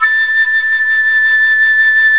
FLT FLUTE, C Flt vib; Roland LCDP04 Orchestral Winds; 90 s Sample CDs; C Flutes 1&2

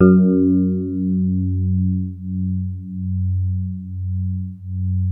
DX7 mid F#2, e-piano; Music Store

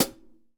HH, EMU PX-7 Drum Machines

